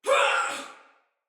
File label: Pain Soldier Female; Battle; Barks; Dialog; Sinecure Soldier VIP Female A